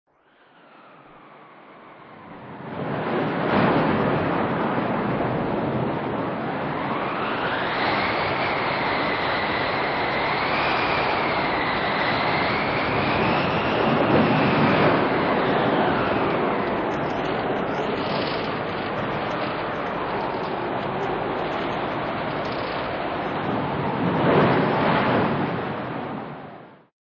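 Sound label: storm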